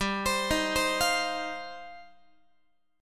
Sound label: G 7th Suspended 4th Sharp 5th, Guitar; arpeggiated; x,15,15,13,16,16; 4A,4E,5A,5D,5Gsharp,6Csharp